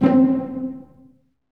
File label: STR SEC, ORCHESTRA; Roland - LA Composer vol. 1